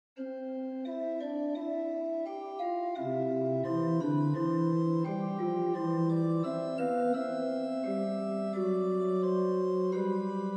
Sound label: easy prelude, organ